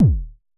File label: Unce Unce Kick D#1, Vermona Kick Lancet; LookIMadeAThing Kicks